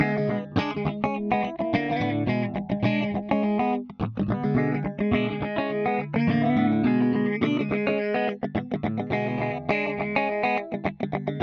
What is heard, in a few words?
Ritm Guitar Line In; UAD Nigel